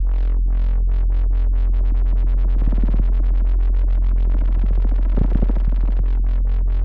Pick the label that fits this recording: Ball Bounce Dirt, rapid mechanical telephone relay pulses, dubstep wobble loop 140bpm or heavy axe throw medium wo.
dubstep wobble loop 140bpm